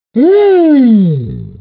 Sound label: woo